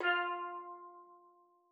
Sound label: STEELDRUM F3; Xsample VOL-4